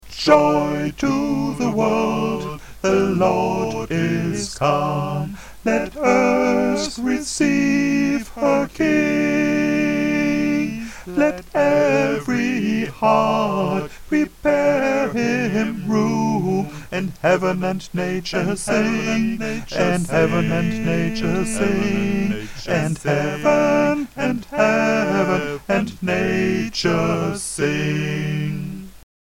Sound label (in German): Sopran; Joy to the Wolrd